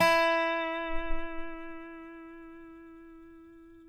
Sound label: DOBSUSE4 -L, dobro notes; Country